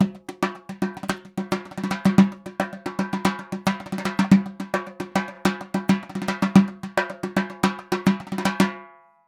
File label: dhol loop, 110; perc construction loops; SEISMIC Drum Sample Pack; Slate